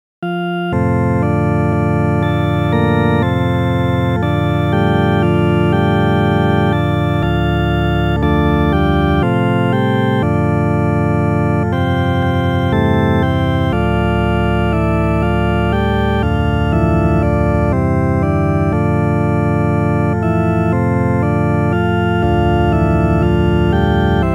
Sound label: solo org Ave Maria